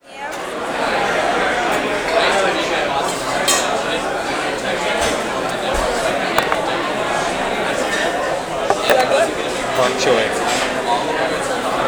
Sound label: diner, ambience